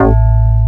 MARIMBA

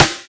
Short Snare, Roland BK-5; Snares

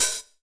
HH, Drum Mashines; EdgeSounds

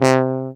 BARITON; C4; Best Service; Alpine Volksmusik

